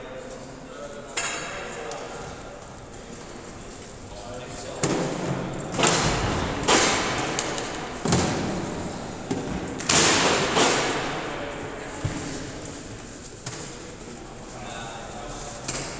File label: foley